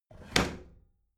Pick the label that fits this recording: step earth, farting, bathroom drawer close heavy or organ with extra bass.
bathroom drawer close heavy